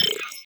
Window Close; Unending Dusk

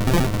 Bonemerang; sfx; attack moves; pokemon; gen 2; gsc

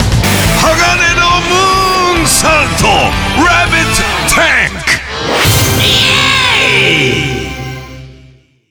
Change Music; Kamen Rider Climax Scramble Zi-O; Bui Change Music Rabbittank

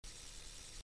Counter Roll

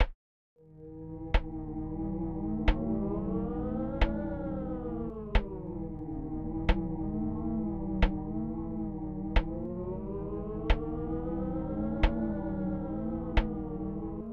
Wind and Clock

